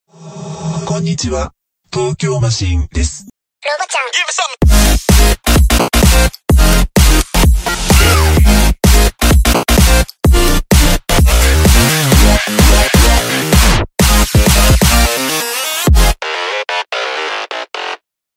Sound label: techno